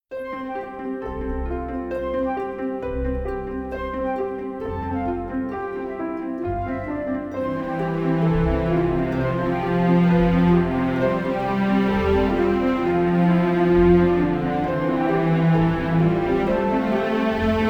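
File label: (No Choir), trailer music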